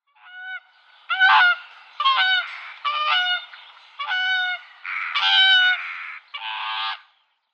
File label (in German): Singschwan Cygnus cygnus; Vogelstimmen